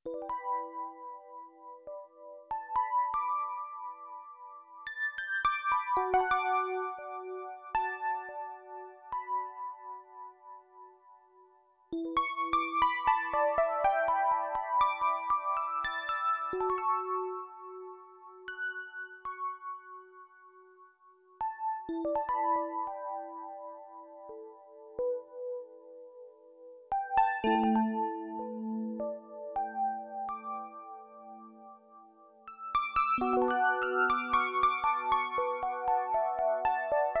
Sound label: Epiano